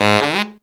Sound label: TEN G# SR, Phantom Horns TENOR FX; Zero-G